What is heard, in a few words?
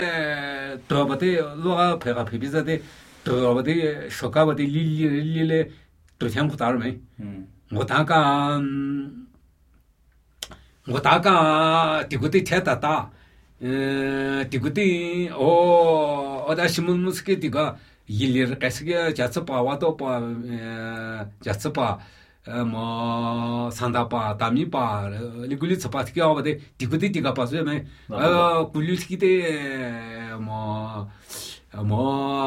Namuyi Tibetan Ritual Chanting